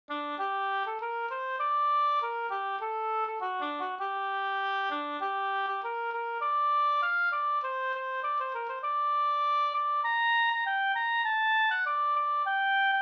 vismelodi; Locklat Skogstorp

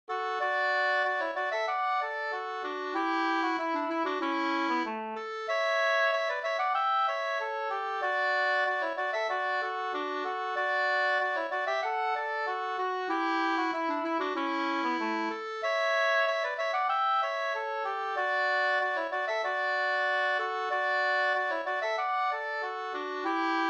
ganglat; e Petter Blomberg Mp

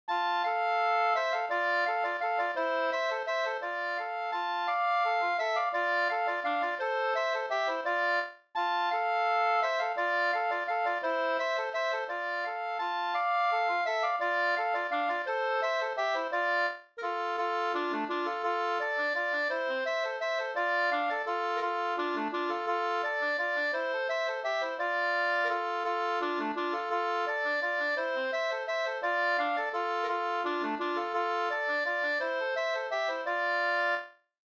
vals Brudvals; e Arvid Brannlund Ragunda SvL

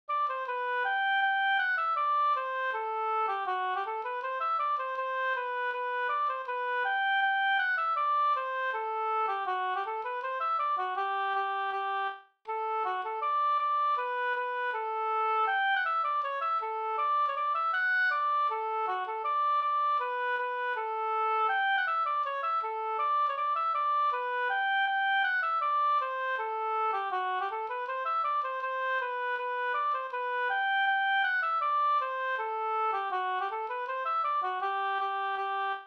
schottis 4-4

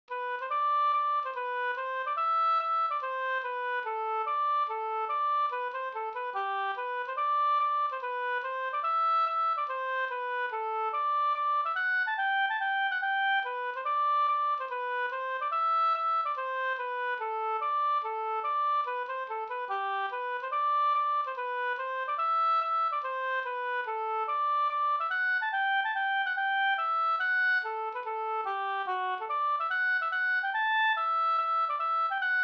reinlender

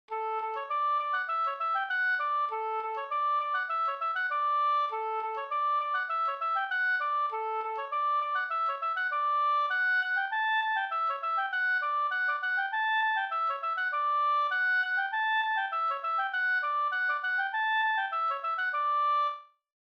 halling; fr Norra Finnskoga

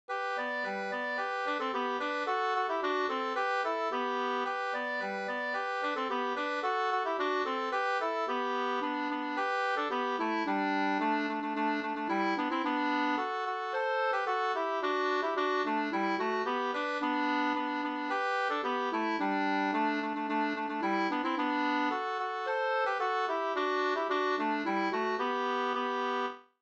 polka, 2st

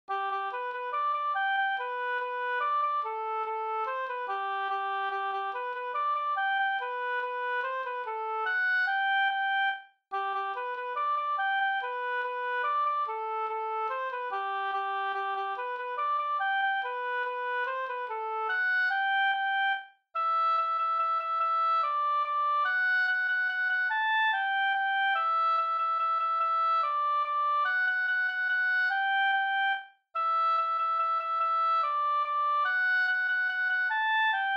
mazurka

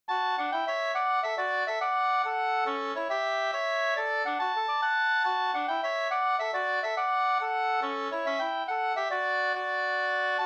mazurka; e Sigbjorn Osa no 2st

